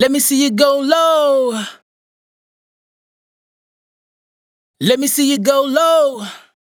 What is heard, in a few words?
hook 99 F#m, Dry Vocals; Raw Vocals; Go Low; Slate Digital Omnivox Vocal Sample Pack